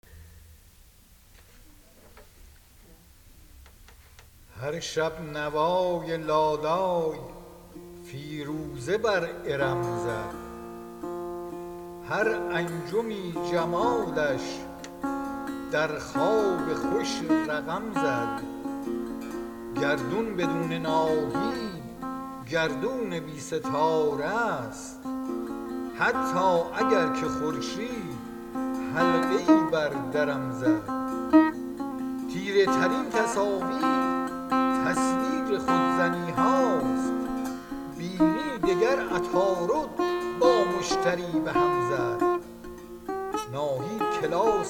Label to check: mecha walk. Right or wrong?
Wrong